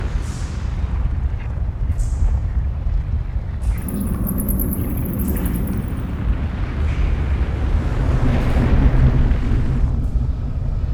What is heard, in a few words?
cave loop; dexsoft; Goblins and Grottos